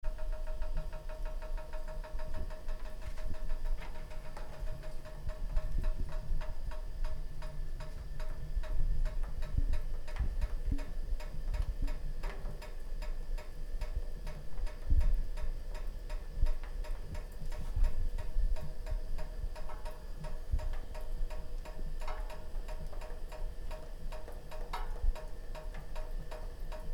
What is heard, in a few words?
fireplace